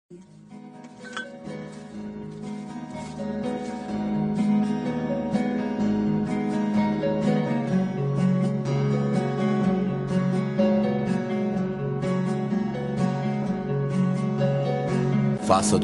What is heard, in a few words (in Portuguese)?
rap intro